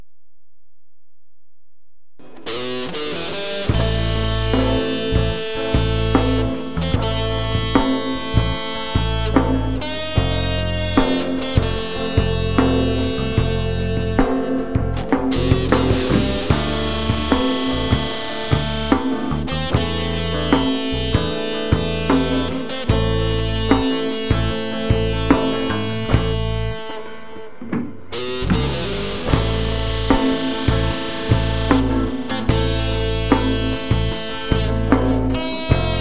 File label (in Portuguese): instrumental demonstracao